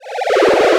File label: Cry